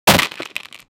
unityde bir oyunda box ic, puzzle

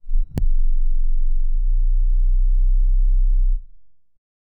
futuristik rulet yksek fr, digital hum

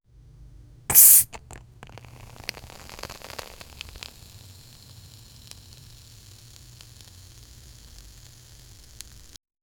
petrol dklme sesi

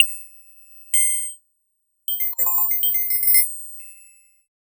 neon rulet parlak plink, chimes

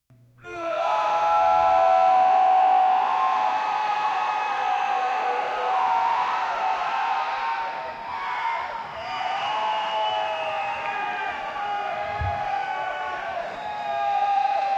10 saniyelik bir takdir a; cheering

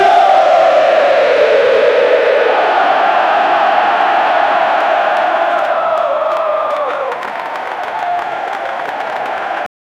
10 saniyelik neeli ve rit, cheering

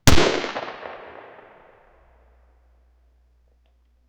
bir silah veya benzer bir, gunshots

